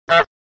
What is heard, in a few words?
goose honk; untitled goose